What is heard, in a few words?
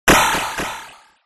sound design glitch lo fi